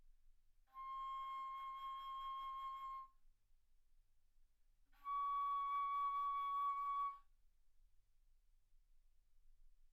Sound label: Bass Flute C6 Db6